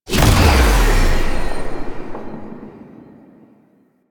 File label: Impiety Stop; Warframe; Warframes; Dagath